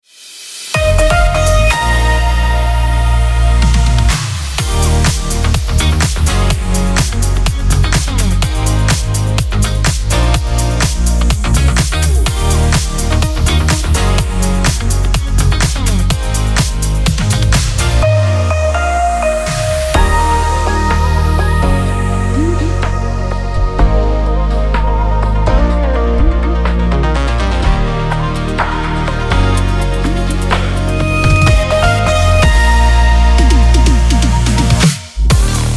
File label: Promotion Hot